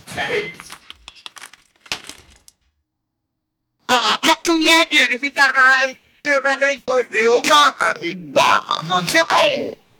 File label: open hi hat